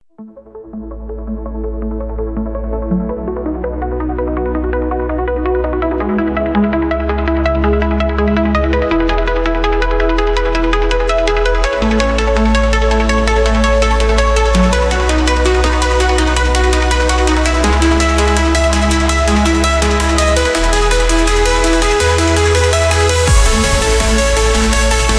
83bpm, Epic Electro

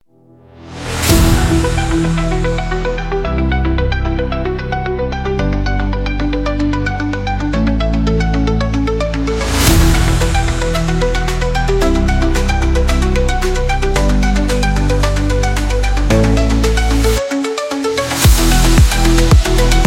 112bpm, Epic-Electro

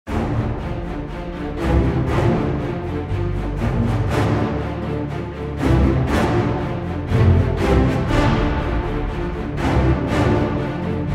march into oblivion 100bpm

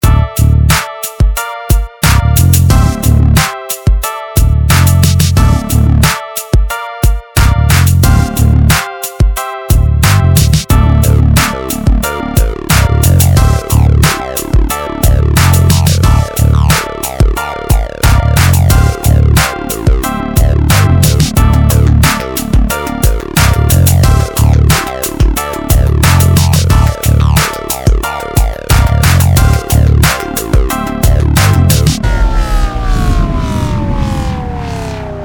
90bpm, electro rock; hartwigmedia

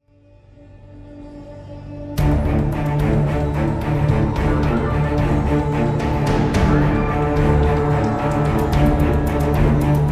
The Fallen City 110bpm, Intros; hartwigmedia

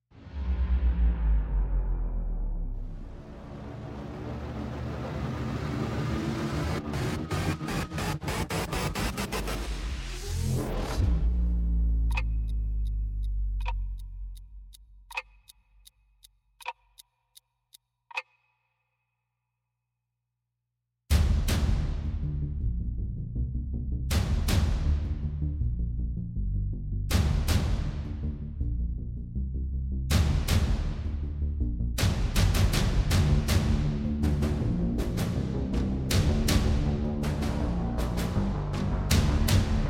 timeless 80bpm

